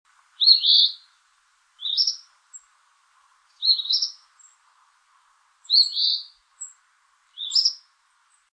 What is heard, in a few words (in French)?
moucherolle phebi